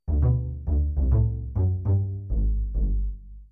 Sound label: Bass